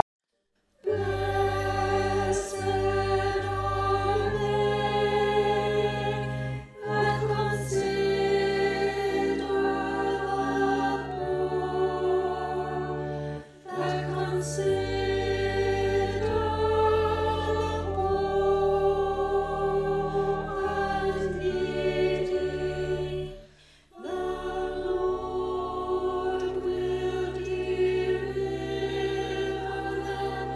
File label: Blessed Alto